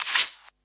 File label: shaker; dough-samples; eddyflux